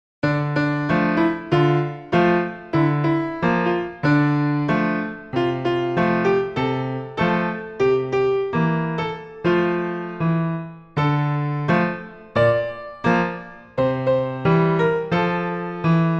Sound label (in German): Russisches Lied